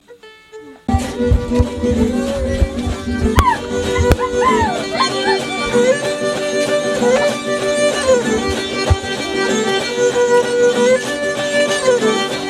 guaranis trecho aldeia silveiras

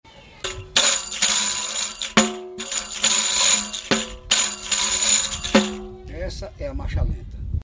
marcha lenta

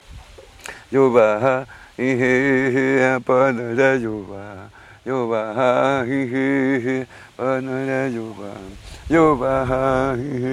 timbiras

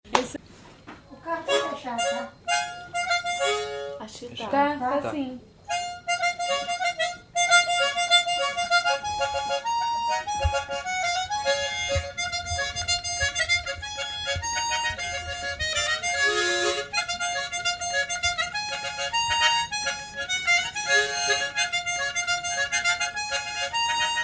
gaita, gaiteiro nordestino; mestre tavares da gaita

